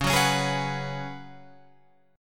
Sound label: C sharp 7th Suspended 4th C sharp, Guitar Open F; C#7sus4; strummed; tuning=Open F; guitar chord